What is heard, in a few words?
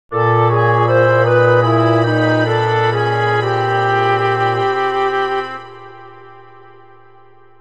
QUEST FAIL; Fable The Lost Chapters; XBOX